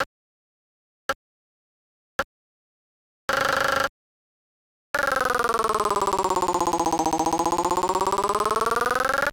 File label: click